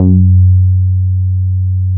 P1 bass F#2, vintage keyboards; Big Fish Audio; First Call

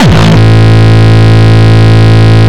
Longkick, kicks

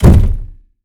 yikes i close it too har, percussion and foley

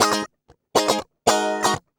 120 BPM

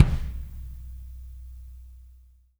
AMB KIK, TRASHY KIT; Spectrasonics; Burning Grooves CD2

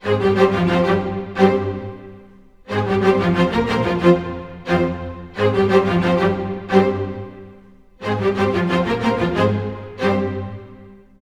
90 f min 4 bars full mix, Orchestral arrangements

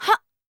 VOICE, EASTERN; World Voices; FX; ILIO; Vocal Planet

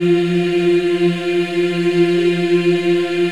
F3, Choir; USB Soundscan vol.28; Acoustic & Synth; AKAI

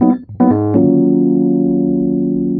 RHODES; Houseworx

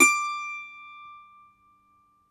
MANDOLIN, Plucked Strings; E-MU Producer Series Vol. 7 – Old World Instruments

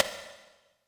Snares, Elektron MachineDrum SPS1 MKII

